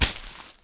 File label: Animal Foot Large; Civ4 warlords